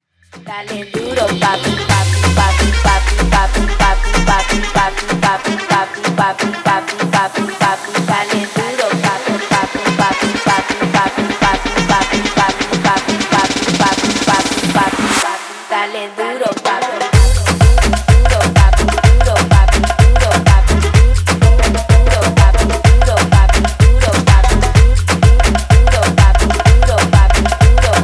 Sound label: trumpets afro 126 bpm